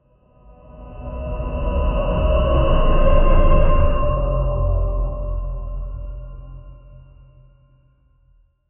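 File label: a slow motion reality warp, universe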